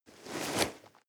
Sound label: nylon leather medium short